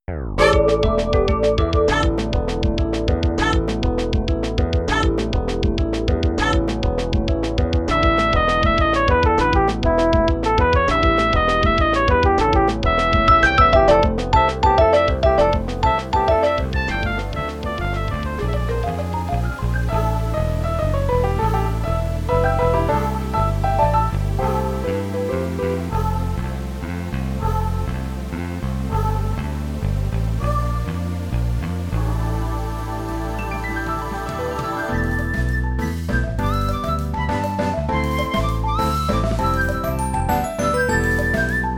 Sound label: Melody; new super mario lost worlds